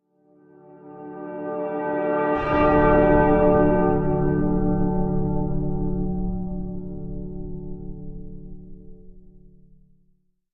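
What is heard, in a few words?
Sting Bells reverse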